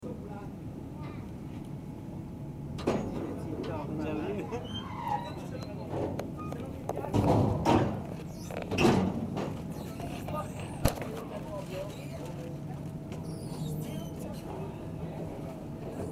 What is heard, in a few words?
boat by a wharf